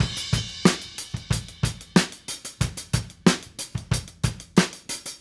Call And Response Drums B Crash, 70s Analog Funk; Sonic Foundry (Sony Creative Software); What it Is!

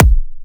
kick heavy varia

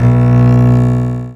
e4, Contra Bass, AKAI S-612 + S-900; Retro Sampler

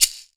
shaker, pop rock set

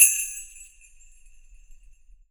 SLEIGH, PERCUSSN; Big Fish Audio; Ross Garfield Drums VOL-2; GM PERCUSSN

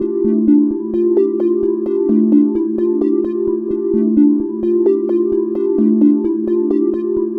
Ambient Arpeggiation, EDM; Sonic Foundry (Sony Creative Software); Afterhours EDM

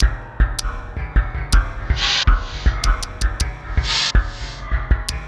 80 LP, Industrial Loops; RISK

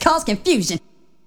VOX SHORTS; Megabass Remix! 2

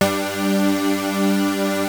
Prophet 5, D4; Hollywood Edge - Giorgio Moroder Rare Synthesizer Collection

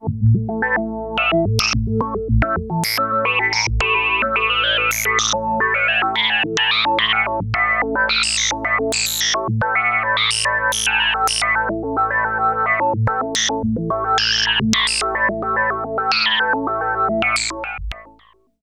HOLD, Tekno Industrial; East West

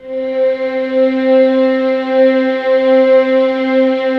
violin C4, soft violins; Q Up Arts; Denny Jaeger Master Violin Library

